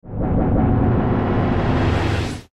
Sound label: Sci Fi Vortex Warp, Sci Fi Whoosh Pass By Electrical